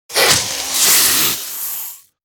Snake rapid strike attack

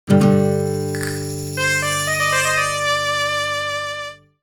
Spanish transition accent ole cartoon, guitar

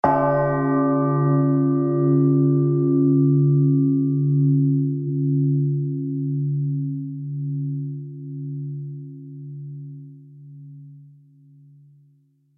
Death bell toll ring funeral grave; large bell

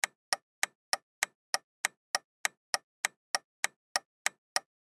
Car interior turn signal loop

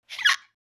Young female teenager hiccup

